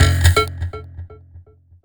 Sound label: 127 sound 1 L, Fresh Disco House; USB Soundscan vol.20; AKAI